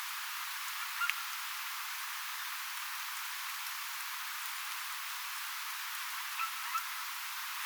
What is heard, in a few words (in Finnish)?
onko tuo merikotka